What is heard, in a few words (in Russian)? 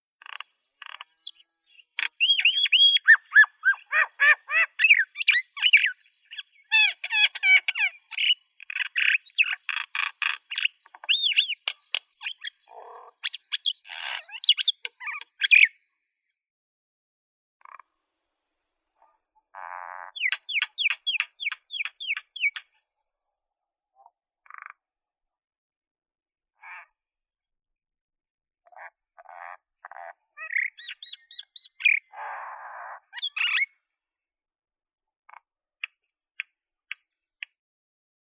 maina obiknovennaya acridotheres tristis